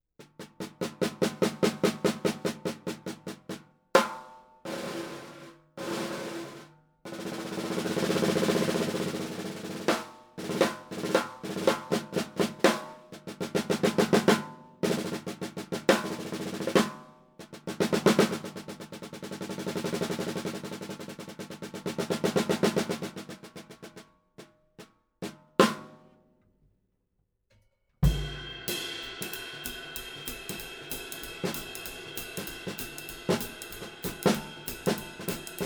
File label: Sling 5x14; COB